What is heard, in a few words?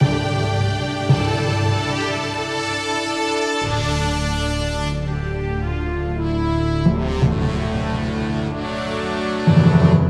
WIN AGAINST CHAMPION; Fanfares